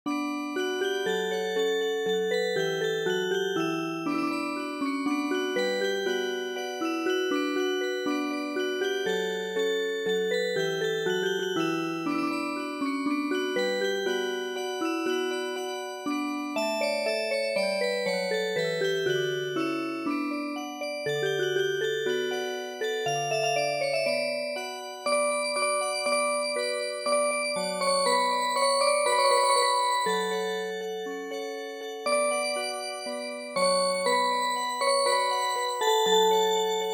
Music Box, traditional

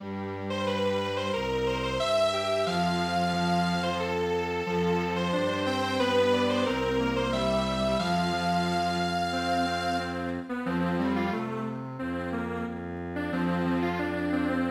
Strings Quartet, traditional; E HULI MAKOU

